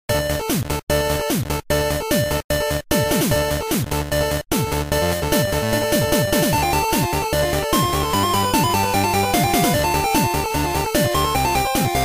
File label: Normal Battle